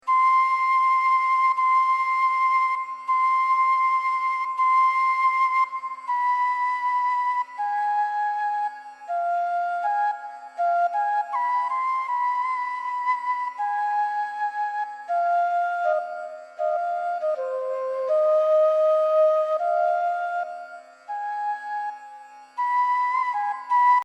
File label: Tintal, tabla; 1 cycle